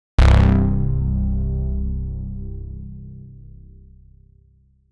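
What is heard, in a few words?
synthwave outrun bass pluck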